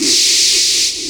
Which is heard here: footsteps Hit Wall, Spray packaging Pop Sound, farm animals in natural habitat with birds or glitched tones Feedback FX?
glitched tones Feedback FX